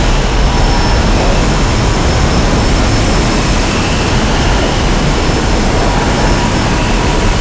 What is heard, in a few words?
loop, vehicles speeder; Quake 4 Alpha